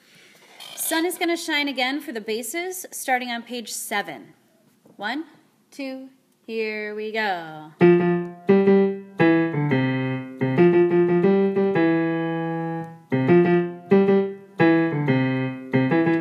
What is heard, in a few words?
sun is gonna bass